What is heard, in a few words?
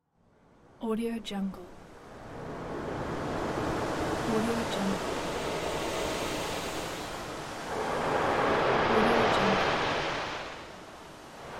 approaching wave breaking at beach